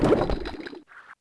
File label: nitro box; Crash Bandicoot The Wrath Of Cortex; XBOX